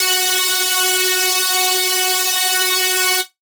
Lead Shot F#, dubstep; One Shots; Black Magic; Ghosthack; Dubstep Sound Arsenal

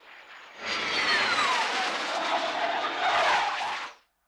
MIG Sweetener, Aircraft; Vehicles

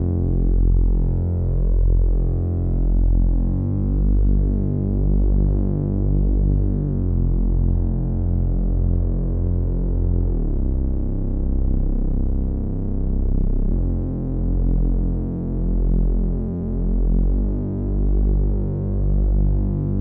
140 BPM C Min Bass, Ambient; Melodies; Loop Stems; Cymatics; GHOST (Beta Pack); Boulder

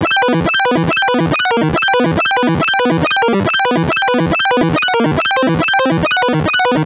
Riddim Melodic Loop E 140; Boss Battle; Ghosthack; Riddim Essentials Volume 2

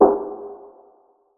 Perc; Solitude; Cymatics; PHARAOH Beta Pack; Percussion

